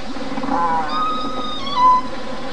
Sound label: simulated Call